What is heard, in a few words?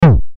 Player Enemy Player Hit; Twee; Windows